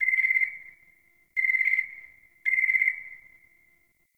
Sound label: Night Zone Tree Critters; Warframe; Eidolon Plains; Ambience